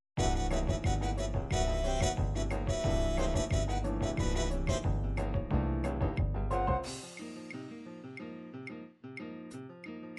bossa nova bossa fast, midi

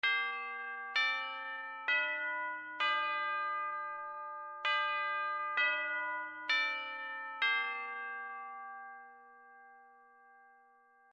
morning church bells; super nintendo; japan; sequenced; lennus; squall

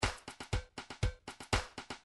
drums

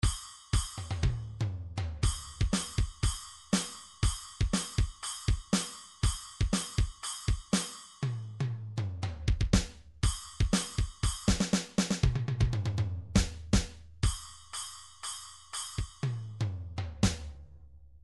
drums rock, midi drums

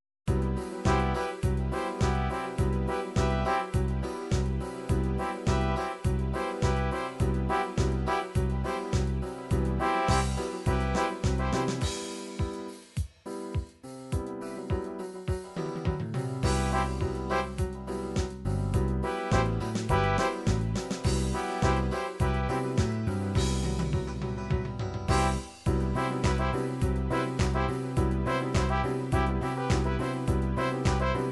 70s disco

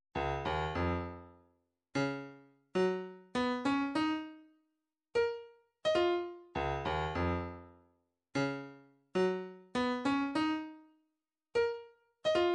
v drums, midi

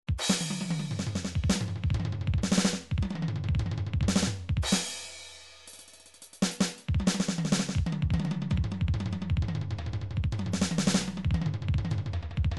midi drums madness

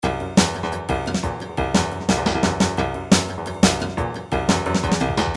drums sc88pro; Paul Kodish Dangerous Drums

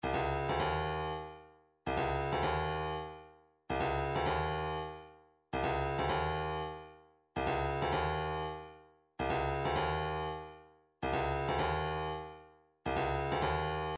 bongo, midi

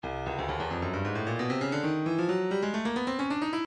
stylus breakbeat midi files 133 bpm drum n base; drummundbase

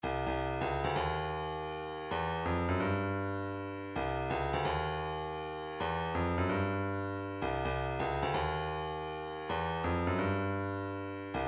130 kick, midi

